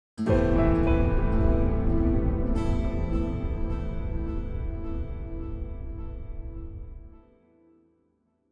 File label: contemplative; Joe's Diner